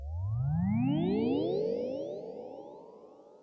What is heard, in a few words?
FX Industrial 140